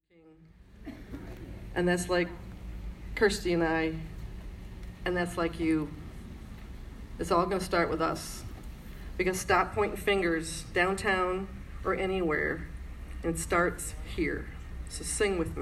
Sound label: Leading the Crowd in Song